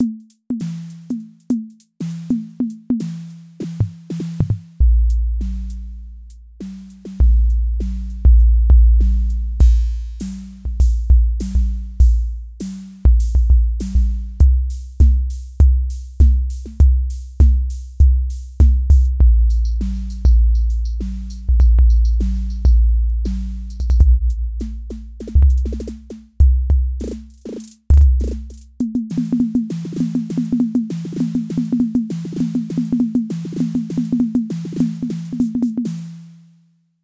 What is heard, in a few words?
808 drums; jwp homage line 808 drums